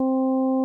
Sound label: fm triangle; Chiptune